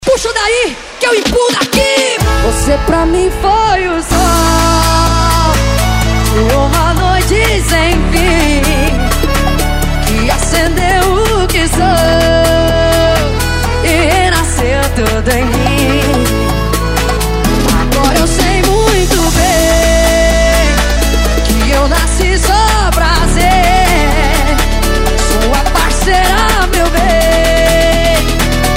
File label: Sertanejo Remix